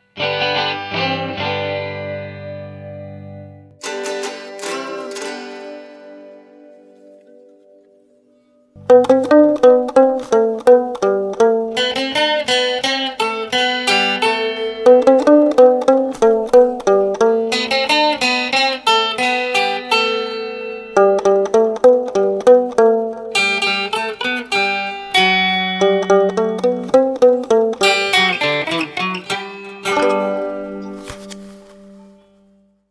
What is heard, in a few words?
Amped And Mic'd, Guitar; The Hero Guitar